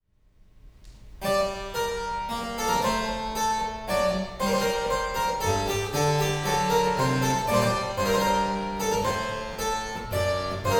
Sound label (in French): pieces de clavecin menuet